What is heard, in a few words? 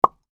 pop